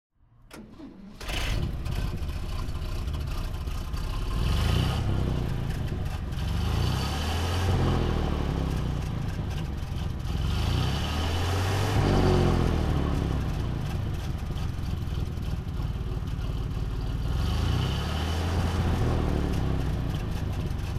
rolls royce phantom throttle, Rolls Royce Phantom I Warwick limousine; 1928; Engine sound; V3D/ ClassicarImages